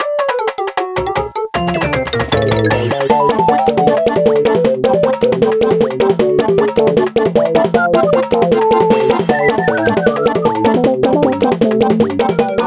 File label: Bonus With Yoshi Drums; NSMBU